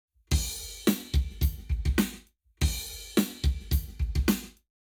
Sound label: Lab Wet Drums 0to360deg; eMagLS